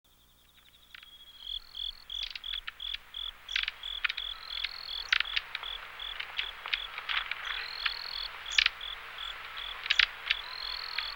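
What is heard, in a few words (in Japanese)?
エナガ, 野鳥の鳴き声; 筑紫小野鳥観察ｸﾗﾌﾞ支援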